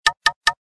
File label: Knock; KV500 Cookie; SMS